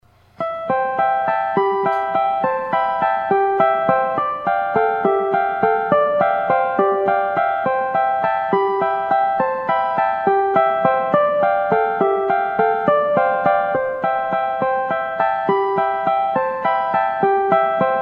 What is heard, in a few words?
circus wagon